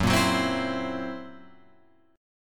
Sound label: Gb 7th Flat 5th Gb down Guitar Open F; strummed; JGuitar